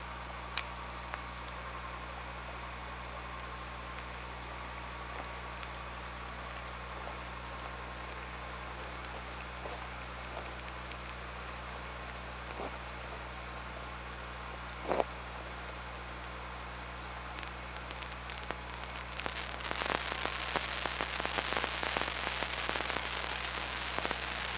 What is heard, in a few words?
OrcaLab